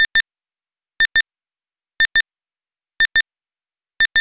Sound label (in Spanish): doble tono